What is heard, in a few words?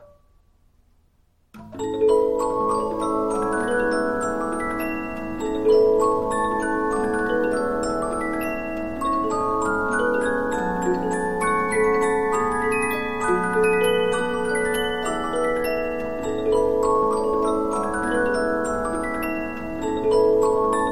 Polyphon; musicbox 15inch; Fruhlingslied